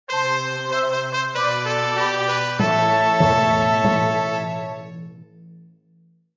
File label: Fanfare; Telepath RPG Chapter 2